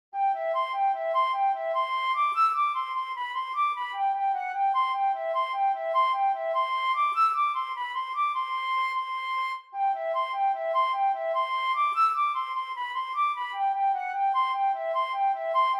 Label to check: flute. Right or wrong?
Right